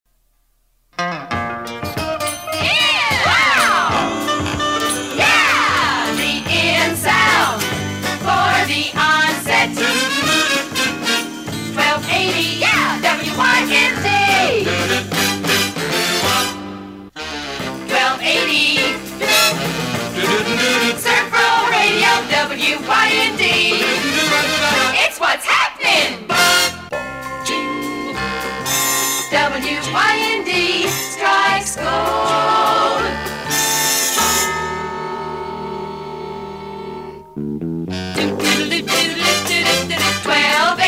Jingle Comp; WYND

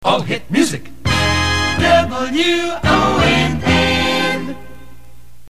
jingle all hit music; WONN

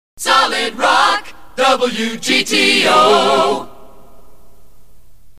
accapella; WGTO; Solid Rock

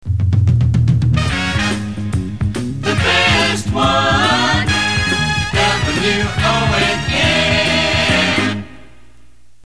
jingle the best one; WONN

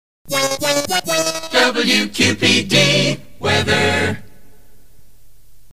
jingle Weather intro; WQPD

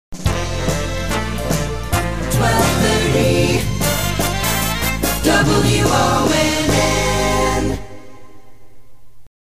jingle; WONN; 1230 WONN

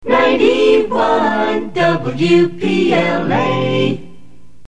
jingle; WPLA; 91 WPLA

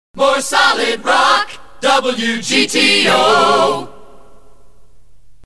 acapella; WGTO; More Solid Rock

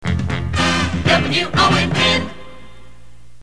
jingle; WONN

